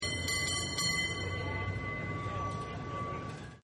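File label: San Francisco Cable Car Bell; Streetcars; Sound Effects:Vehicles; Sanfran Cablecarbell2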